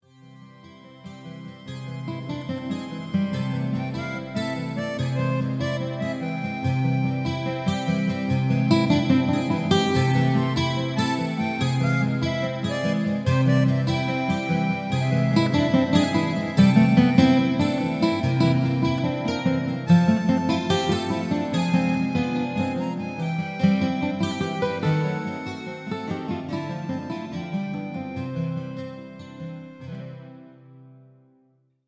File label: cromatic